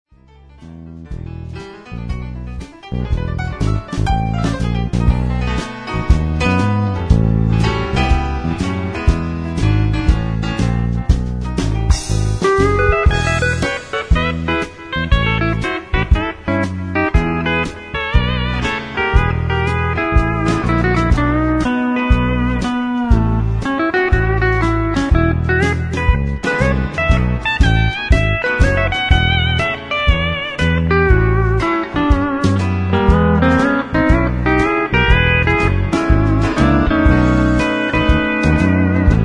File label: Game Over Blues